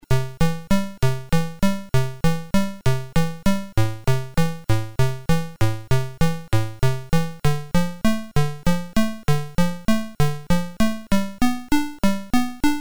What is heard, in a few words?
arp prog